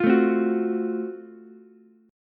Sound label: Gb Minor Major 7th Flat 5th, Ukulele; strummed; JGuitar